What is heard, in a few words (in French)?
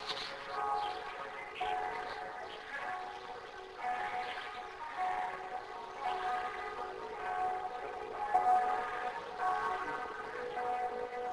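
cloche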